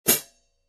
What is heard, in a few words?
14in Rock HiHats, cymbal